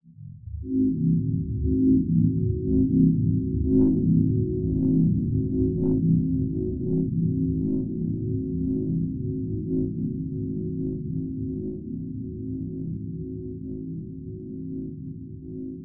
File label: spaceship